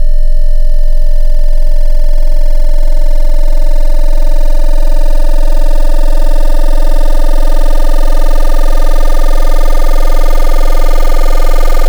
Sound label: Jagged Sine; Wavetables; Synthetic's Serum Collection; Cymatics - Jawz for Xfer Serum